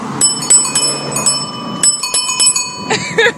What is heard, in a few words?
bike bell